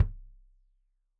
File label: Kik Stereo; Drum; Deeply Sampled Drums; SMDrum